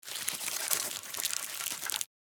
Insect little monster scuttling tiny movement

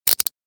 Prisoner handcuffs latch closed

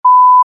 Censor beep short

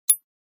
Grenade safety pin pull; Military Equipment

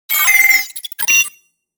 Glitch accent distorted computer

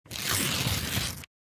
Thin paper tearing

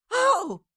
Cartoon little child voice sigh surprise

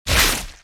Werewolf claw slash strike

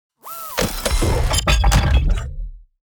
Sci-fi gizmo metallic sequence futuristic

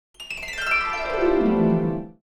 Cartoon flashback

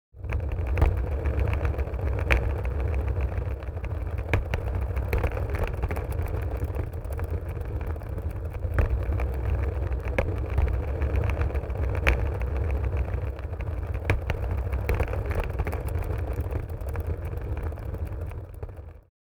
Suitcase dragging rolling on wood floor

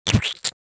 Cartoon fart splat wet airy bubble

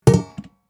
Pedal bin close lid; household

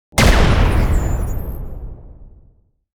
Sci fi plasma cannon fire single shot

